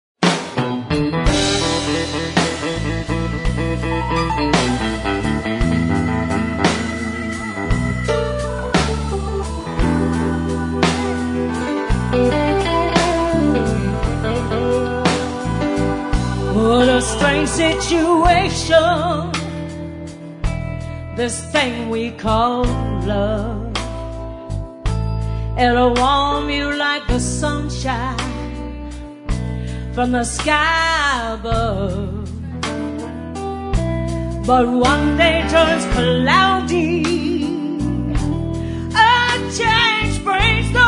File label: Blues